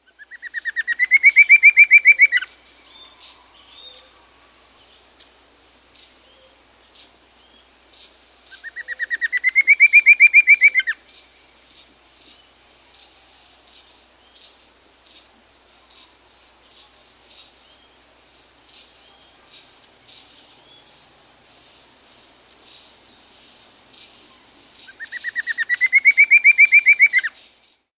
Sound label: Rusty-Breasted Antpitta; S. America